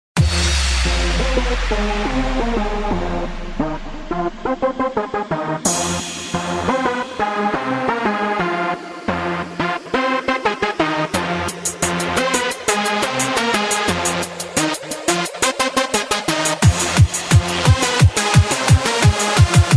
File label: Tekno Machine